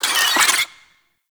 Infested Ashen Vocal Pain; Warframe

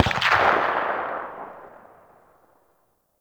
Weapon Enemy Tail Outdoor; Warframe; Weapons

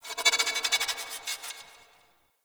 Corpus Ship Data Rand, Ambience; Warframe; Corpus Ship Remaster

